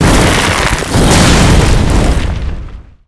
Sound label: Victory; Crusher